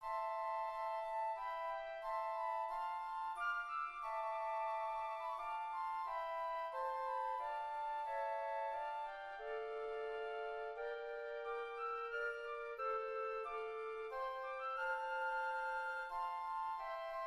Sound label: Flutes; Christ the Lord is Risen Again (Christ ist erstanden); Hymn 184